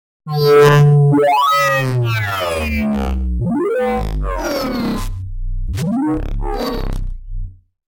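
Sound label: robot sounds; zvuki robota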